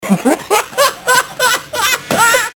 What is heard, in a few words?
ahaha